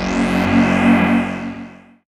loop; X-Static Goldmine 3

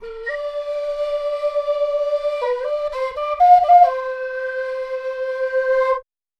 Tropical Flute; Sonic Foundry (Sony Creative Software); Ma Ja Le Ethereal Textures; Flutes

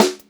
152 SPEED SD, Pop-Rock Drumloops; USB Soundscan vol.37; AKAI; 90 s Sample CDs

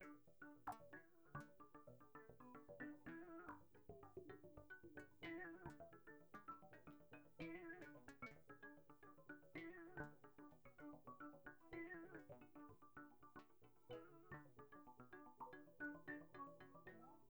111 BPM

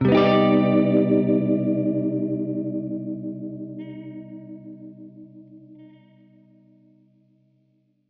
Gretsch Chord Cmin9[maj7], Guitar Chords; undercover samples

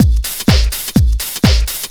spit and polish, Deep House 125bpm

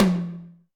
Tom Hi Slam, Alesis D4 drum machine

